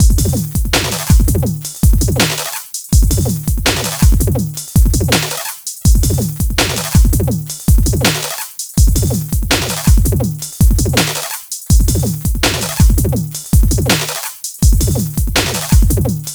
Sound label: elec drums jazzy 4ths